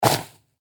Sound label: player walk dirt loop; footsteps